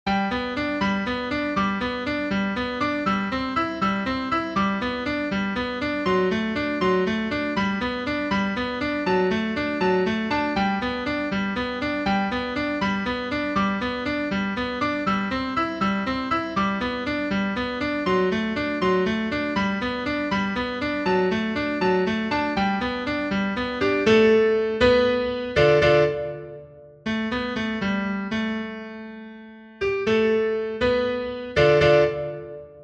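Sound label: Dance, solo piano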